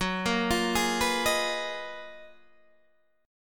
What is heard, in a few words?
F sharp Major 13th Guitar DADGAD